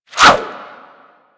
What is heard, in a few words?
Sword And Whip Whoosh; Warframe